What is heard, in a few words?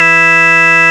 CLICKY E3, clicky organ